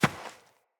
Fawn Step; Encounters; Animal Rescue

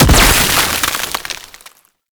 Freeze Stack; Warframe; Nineteeen Ninety Nine; Deep Archimedia

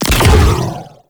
Disc Fire; Warframe; Corpus; Corpus Railjack; Spec Ops Riot Biped; Riot Moa

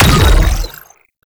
Doom Pulse; Warframe; ManInTheWall; Octopede

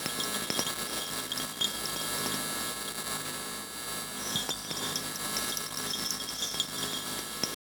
Fluorescent Light Flicker Loop; Warframe; Ambience; Nineteen Ninety Nine

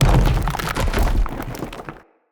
Arena Rock Fall Impact; Bromius; Abilities

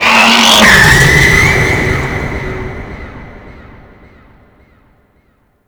Vay Hek Frame Charge Roar, Vocals; Warframe

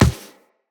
Walk Default, Footsteps; Operator

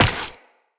Step Ice, Footsteps Walk; Tenno

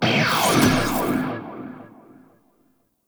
Exalted Equip 3D; Warframe; Temple

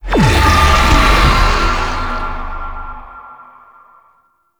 tank infested heal lure call; Enemies; Abilities; Lasrian Tank; Warframe

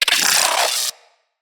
Arachnoid Micro Vocal Pain; Enemies; Corpus

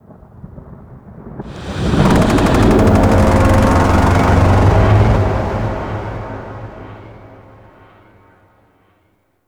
Void Dragon Vocal Distant Idle; Enemies; Vocals; Warframe; Duviri

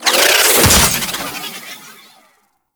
Parazon Hack In

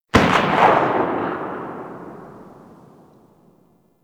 Ogris Tail, weapons

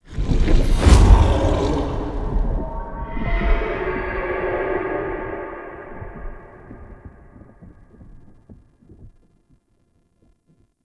Stag Stampede; Stag Pact

